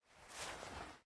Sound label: Stop Show Guitar; game audio; MitaCap; MiSide